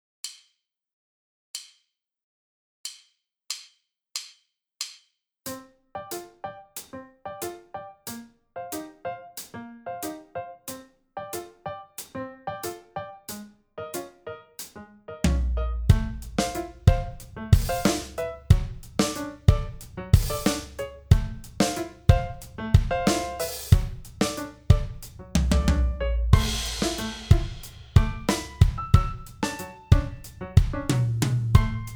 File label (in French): batterie piano